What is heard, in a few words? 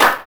GRUNGE CLAP; Drums In Your FACE!; CLAPS